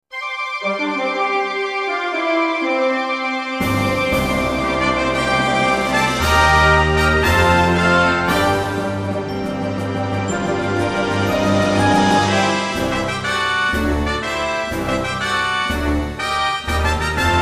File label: Orchestra Medley, Edirol SD80